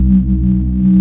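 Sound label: throb loop, ships engine; Quantum Redshift; XBOX; marcus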